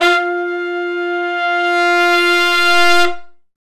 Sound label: Long Note w Swell (F) unison, Horncraft for R&B; Crimson Blue and Fabulous Horncraft 4 RnB; Sections